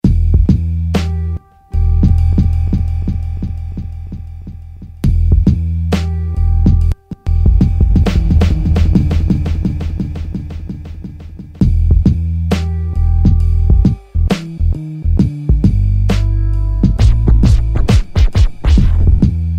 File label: Trip Hop, Yamaha DJX-IIB